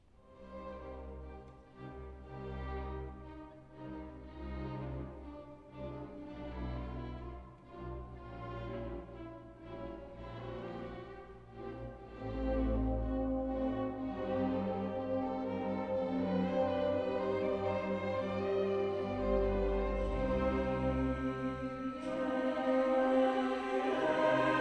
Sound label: mozart mass in c minor KV427 kyrie